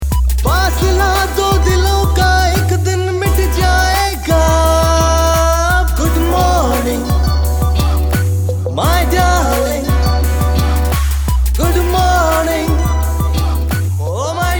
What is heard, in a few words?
GOODMORNING HINDI MALE